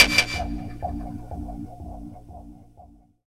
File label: Perc; FX; Jungle; Maintain Perc; M8